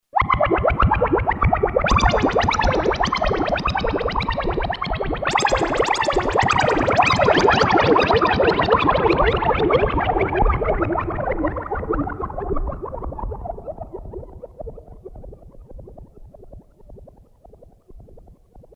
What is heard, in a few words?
SFX PAN; Akai SG01v